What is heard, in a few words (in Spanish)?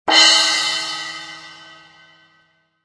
cimbalo